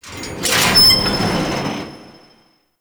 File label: Sea Lab Hanging Chain; Warframe; The New War